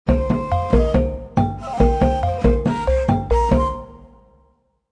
Mongolia Music Short; Civilization Revolution

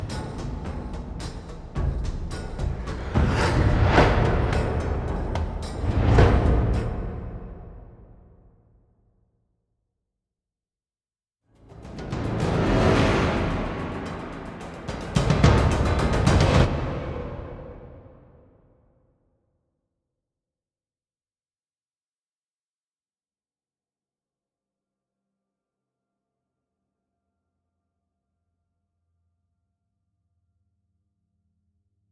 End Music; Cs Xsquad; Chronicles of Riddick Assault on Dark Athena

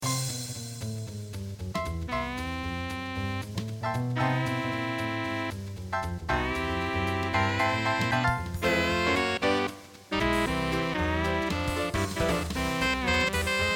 Jazz; M&M's Shell Shocked The Lost Formulas

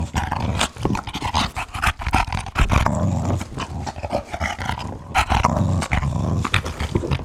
Dog Moving Loop; angry birds rio

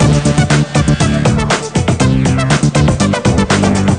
Music Disco; J4G A Girl's World; NDS gamerip

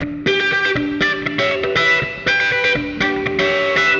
Guitar wet; Nimbus Station; lego universe macos windows gamerip 2010

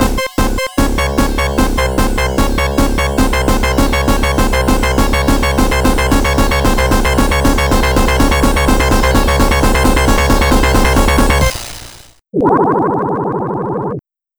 Drowning Jingle; Sonic Robo Blast; The Sounds of Robo Jam; SAGE 2020

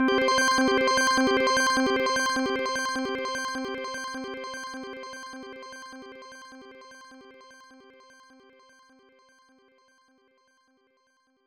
Bonus Slots Fast; magical hat no buttobi turbo daibouken; genesis

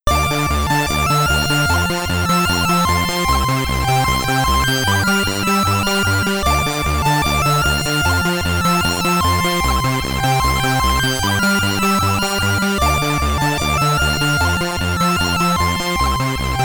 Name Entry (SID Stereo); Ninja Commando; Commodore 64

